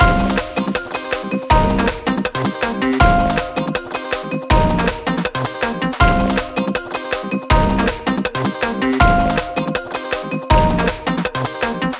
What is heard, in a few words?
Melody AND Drums; a hat in time gamerip